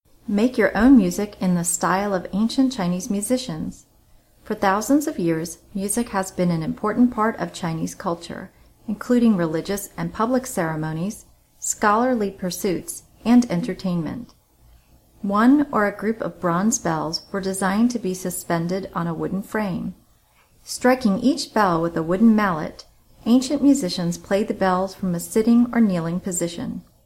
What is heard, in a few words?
chinese bronze bells; VMFA